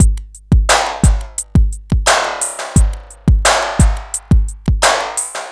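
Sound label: FLANGED CLAP 87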